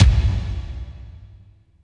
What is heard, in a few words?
KICK, Tekno Industrial; East West